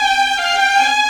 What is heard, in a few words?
Viola, Violins, Orchestral, Rock Pop